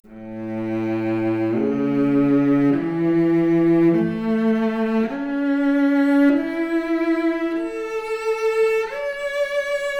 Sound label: Violoncello arp, Stereo output noised; 3bit